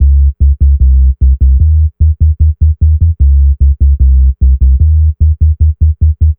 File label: Synth Bass; Basses